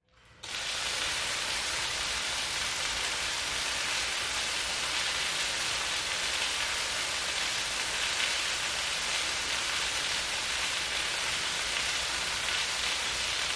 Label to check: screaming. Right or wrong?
Wrong